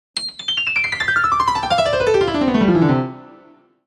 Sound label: Descending fast Piano Scales from high to low C major; Musical